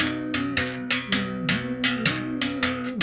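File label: 79 bass berimbau phrase; Roland LCDP11 Africa VOL-1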